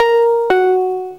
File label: Ding; Chronotrigger